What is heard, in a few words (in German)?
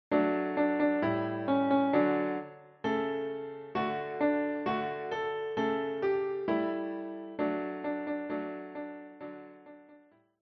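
Auf einem Baum ein Kuckuck sass, Klavier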